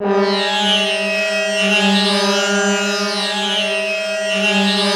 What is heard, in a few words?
SCREAM2 C3, Yamaha VP-1, SCREAMSYNTH; SCREAMSYNTH2; Music Store